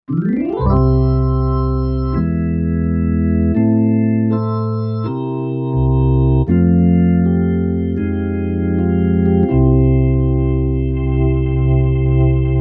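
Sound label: organ Gospler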